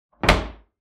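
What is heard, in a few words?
door slam; hlopok dveri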